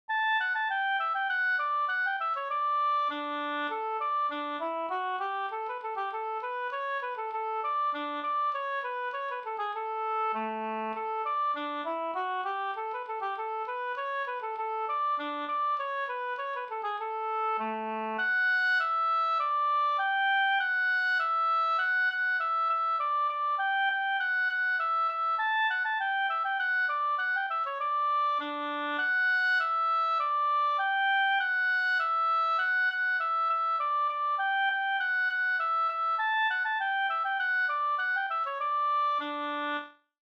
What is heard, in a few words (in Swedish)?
folkdans, polska; Knytnavspolska